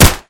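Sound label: muffled shot; sdelano v audacity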